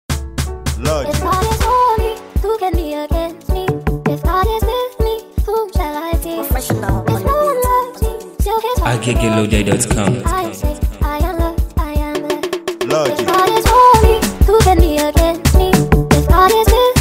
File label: Beat SpeedUp